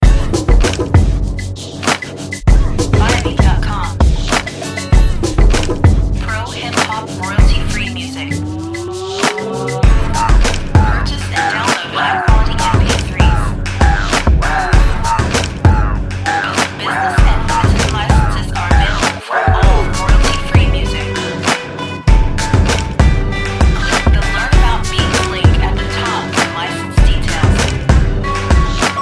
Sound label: ringtone, dance